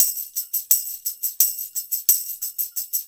180, Mediteranean Traditions; Soundscan vol.53; AKAI

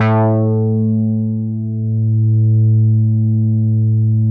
MEMORYMOOG

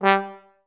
G2, STAC; Best Service; Peter Siedlaczek's Advanced Orchestra

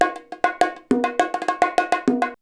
BONG, BONGO 100, Modern Percussion Loops

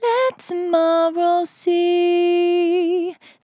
On A Star 65bpm F, Vocal; Zero-G

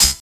snare+hat; DRUMS

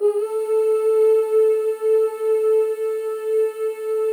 FEM, Symphony of Voices; Spectrasonics

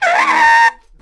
SQUEEL, Booty Brass; E-MU Formula 4000 Series Vol. 1; Hip Hop Nation

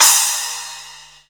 CYM, CYMBALS; USB Soundscan vol.20 - Fresh Disco House I

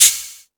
Jungel Percussion, E-MU Pro-Cussion; drum machine

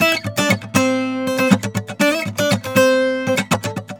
GUITAR SALSA

